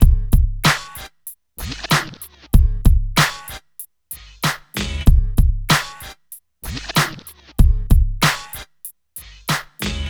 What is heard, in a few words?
Hip Hop Beat, Drums